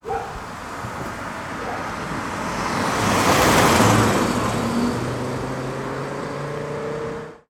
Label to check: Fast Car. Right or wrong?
Right